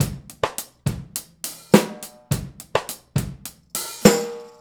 Straight Kit, World Pop Beats

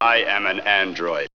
Vocal; ZERO G - Datafile DISC 1

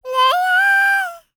LICKS, Vocal Planet Jazz FX; Spectrasonics

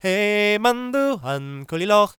GAELIC, World Voices; ILIO; Vocal Planet

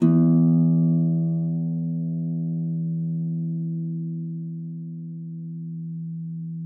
HARP D#2; Xsample VOL-8; HARP P+MF

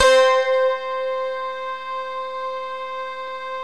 CYMBALO; Roland - LA Composer vol. 1

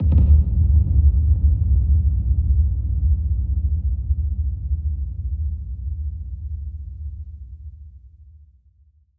Deeep Boom; musicradar

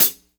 HH, Dance Mega Drums; Best Service; HH HIP

